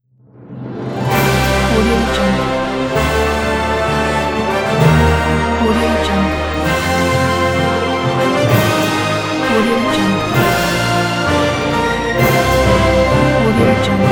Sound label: orchestral logo